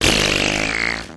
fart

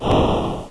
wing; doublespeakgames

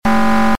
error

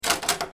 open door; adultswim; FiveMinutesKillYourself

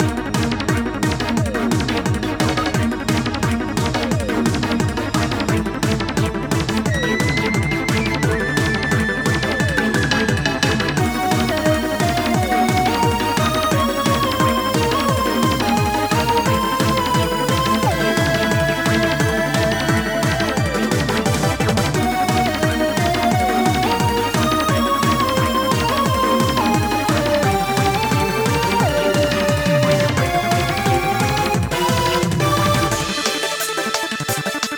battle main; cross-code; oldhideout

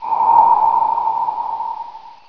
remove ball; playforia; Ghosts

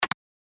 click; anno-1404

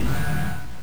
scrape; madcars2; radicalplay

